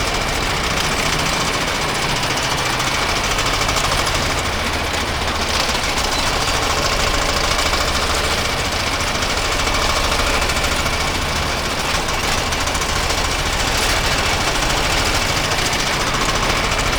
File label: Tank Treads Loop; Enemies; Movement; Lasrian Tank; Warframe